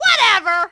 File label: yell; Matt Hoffman's Pro BMX 2; XBOX